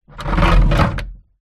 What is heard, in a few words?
household wheelie bin small movement on concrete ground recorded inside